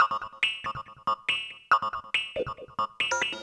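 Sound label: PERCUSSION ELECTRO 140, Techno Drum; Techno2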